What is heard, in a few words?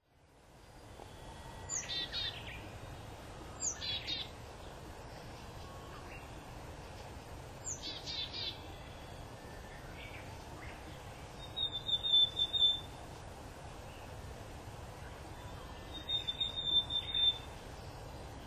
willow tit